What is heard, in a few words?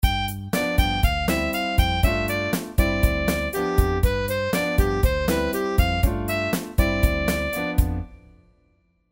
8beat